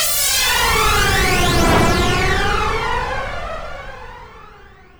Sweep, Fx, Sweeps, Trance; Zero-G Trance Inducer

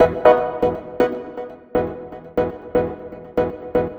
Progressive Synth, Trance

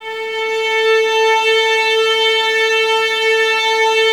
violins A 4, string ensembles; Miroslav Vitous; 11 Violins

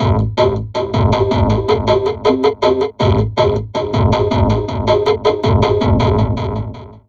Drum Loops Crazy Processed

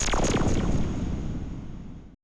AMBI FX

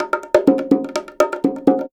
Percussion Loops, Bongo & Conga; Houseworx

